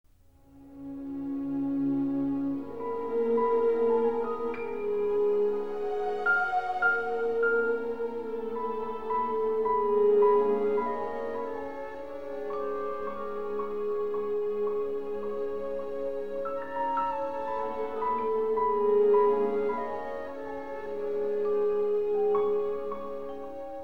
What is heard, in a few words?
classica